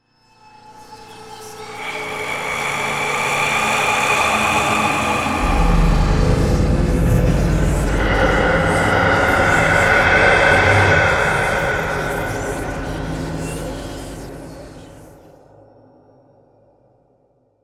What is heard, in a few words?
Ghost Manifestation; Sample Audio Pack; Free Pack